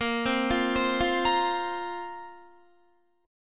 Bb Suspended 2nd Bb down Guitar; arpeggiated

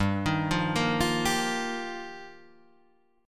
G Minor 7th Sharp 5th G, Guitar Half Step Down

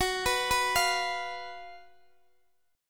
Gb Major 7th Suspended 4th Gb Guitar Open D

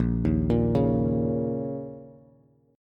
A sharp Minor Double Flat 5th, down, Bass; arpeggiated; JGuitar

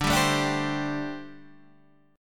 G Suspended 2nd Suspended 4th D

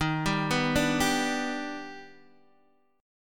D sharp Augmented Major 7th D sharp; arpeggiated; D#+M7; x,6,5,4,3,3; JGuitar

